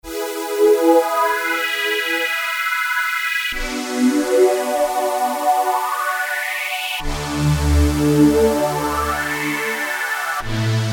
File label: Comb Strings, Roland JP-8000; Factory Performances